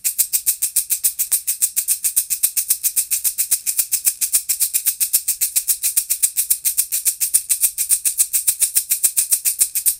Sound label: maracas sound, shaker